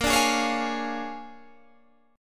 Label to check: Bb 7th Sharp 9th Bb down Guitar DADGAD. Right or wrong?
Right